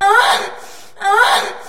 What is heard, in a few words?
female orgasm